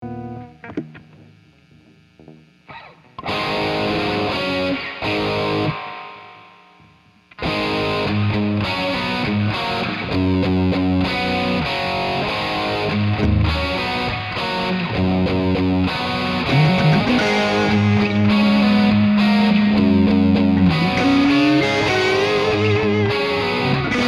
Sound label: blues riff